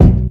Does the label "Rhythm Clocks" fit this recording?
No